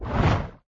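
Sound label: Swimming; bubble-guppies; bubble-scrubbies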